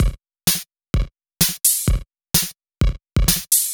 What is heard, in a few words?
Minimal Loops